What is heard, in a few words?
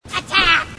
grunt; h3dialogue; grunts